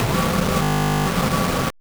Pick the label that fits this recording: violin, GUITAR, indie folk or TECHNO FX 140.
TECHNO FX 140